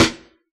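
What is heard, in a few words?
hip hop kit snare; Dr 202 Kit 1; Percussion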